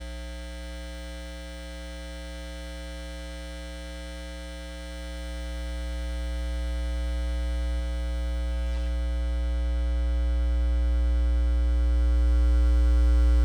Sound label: Electromagnetic Fields, Hummin; electric fields